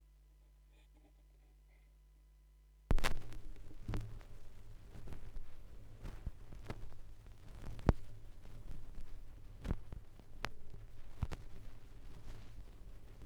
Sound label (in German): schallplattengeraeusche, Vinyl